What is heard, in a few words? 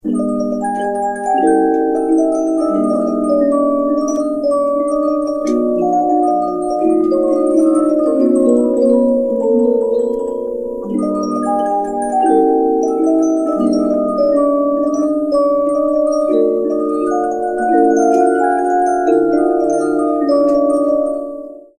victorian music box; ringtones